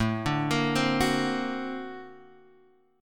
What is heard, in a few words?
A sharp Augmented Major 9th